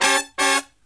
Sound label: Brass Riffs